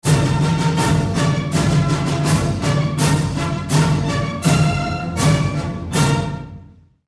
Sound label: Legion march